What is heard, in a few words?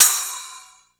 GOTA HATS; AMG Gota Yashiki Groove Activator